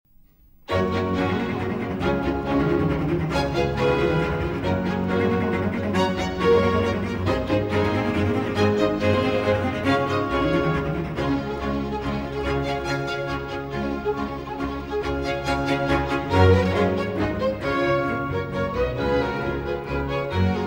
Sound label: Cello Concerto in G Major, Allegro; Vivaldi